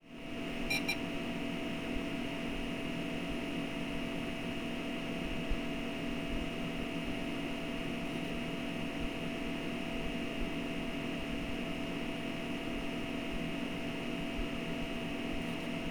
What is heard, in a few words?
maxtor drive beep